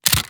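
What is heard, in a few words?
rechamber, shotgun; reloads; iw8; romeo870; gmod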